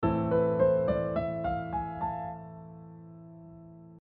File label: aeolian #7